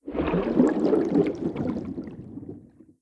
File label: Water; blue archive